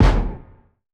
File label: stomp; nova sound